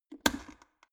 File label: plastic water boiler open lid fast